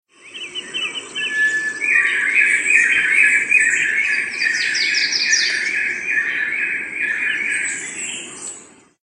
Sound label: singing quail; upland birds